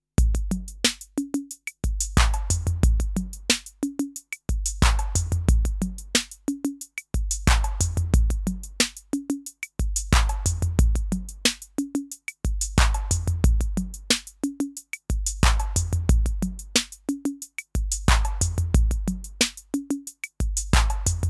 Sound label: Loop